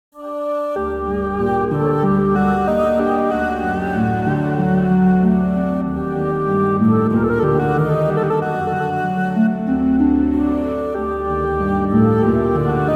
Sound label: arabic oud